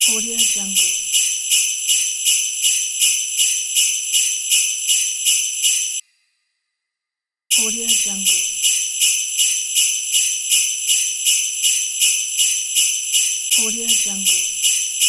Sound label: sleigh bells